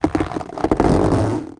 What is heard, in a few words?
baby; uzukumaru